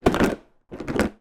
industrial tool box small plastic few tools inside movements